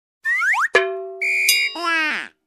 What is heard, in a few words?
Cartoony Swish with drum and whistle